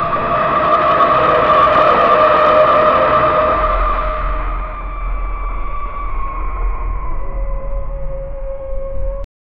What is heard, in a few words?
deep guttural low pitched, supernatural